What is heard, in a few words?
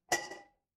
glass ceramic